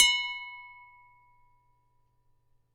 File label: Glass B ff